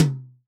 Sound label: Tom, drum